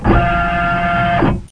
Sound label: rotate; LOTUS